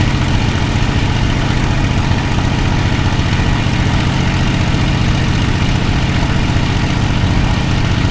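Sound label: mustang2 cockpit right, engines; War Thunder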